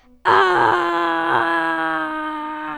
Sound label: short war cry